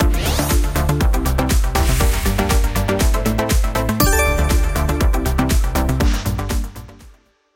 superwin

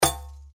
reelspin click; Polynesian

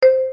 winline; Hot Fruits 100; slot game

